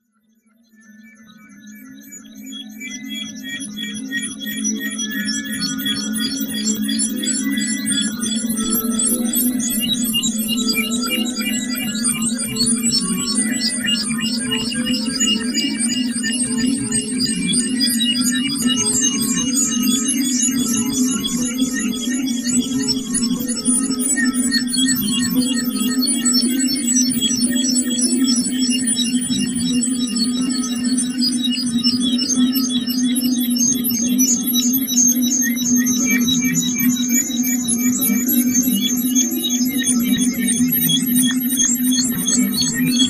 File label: forgotten sounds beeps; PHOBoS, Blue Hell